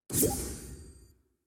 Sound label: socials notification; fortnite